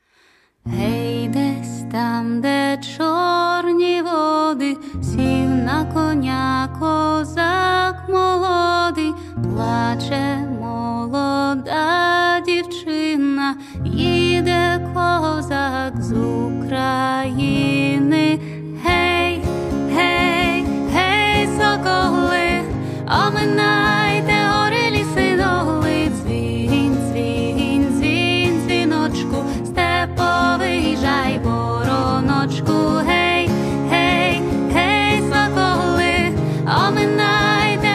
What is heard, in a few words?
ukrainian polish folk song